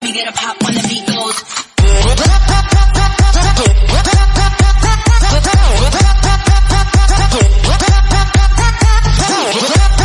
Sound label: Electro Music Ringtone; ringtones